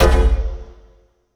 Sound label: BASHED HI, Impacts, Hits & Booms; Distored Reality